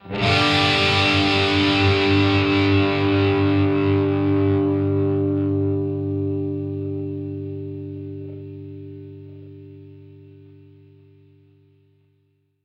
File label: Guitar Disto G chord